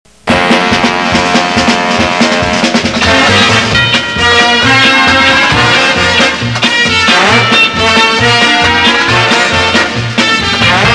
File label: Theme instrumental